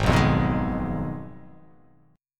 Ab Minor 6th, Ab; Abm6 strummed; JGuitar

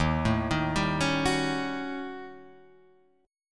D sharp 7th Sharp 9th Flat 5th, Guitar, Drop D

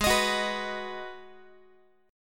Ab Minor 7th down Guitar; strummed; JGuitar

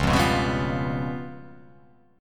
C sharp 7th Flat 5th, chord

